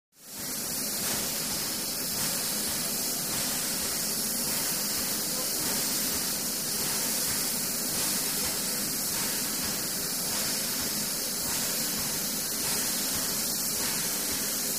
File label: Steam Release Train